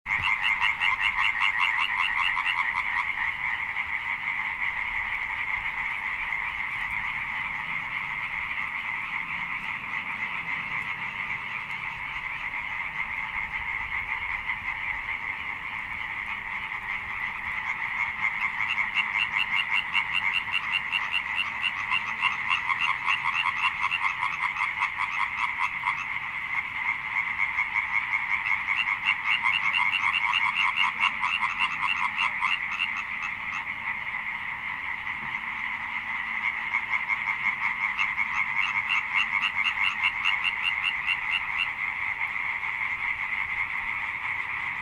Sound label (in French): chant rainette fort avec fond sonore choeur rainette